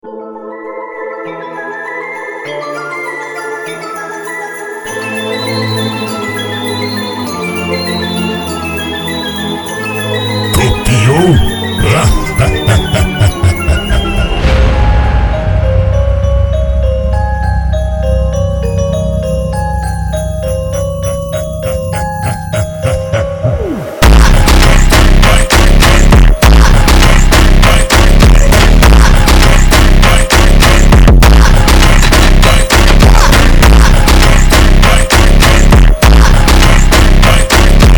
funk

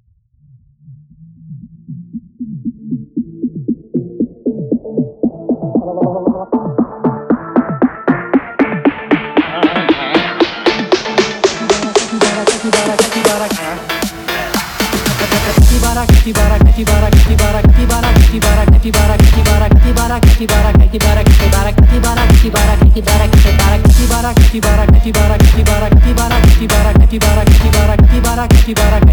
Phonk remix

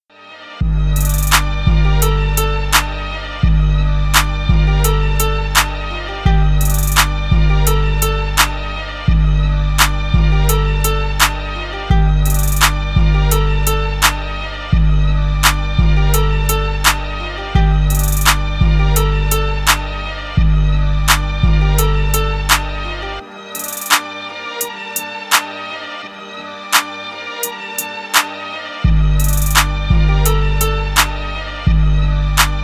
Instrumental; рингтон

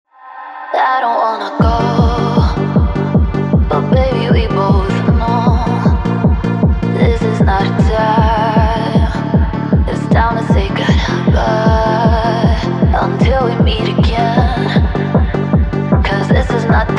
hypertechno

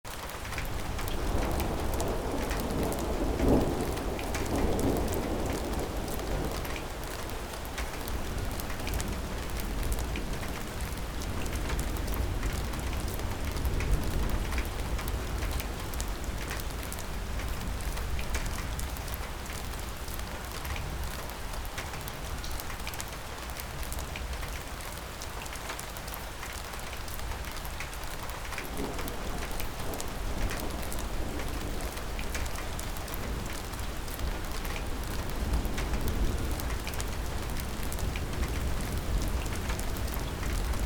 thunderstorm

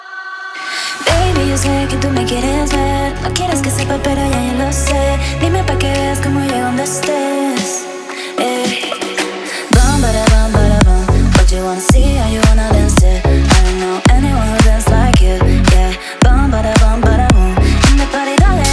falling

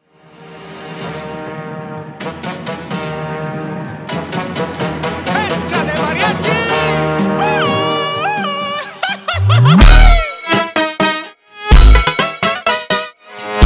mexico funk

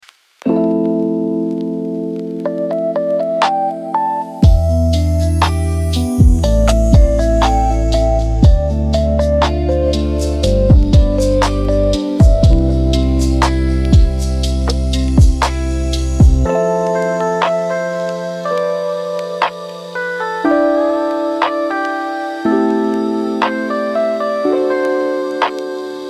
lofi sleep chill study

